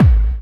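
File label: snare drum bass drum, Dance Mega Drums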